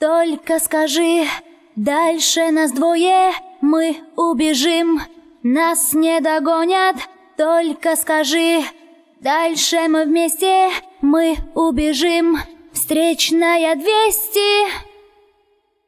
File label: VOX Couplet MIXED, acapellas